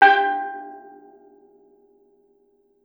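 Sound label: STEEL DRUM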